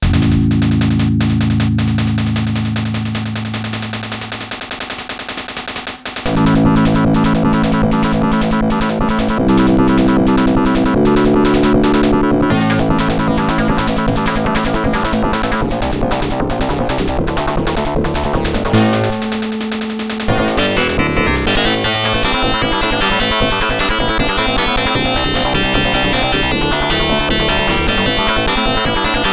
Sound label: crystal cs4280 internal audio; hp vectra vli8